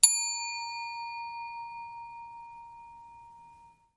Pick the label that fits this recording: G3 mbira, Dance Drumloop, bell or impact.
bell